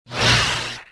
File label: saber hit wall; weapons